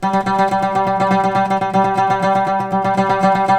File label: OUD G 2; Guitars; Spectrasonics; Hans Zimmer Guitars VOL-2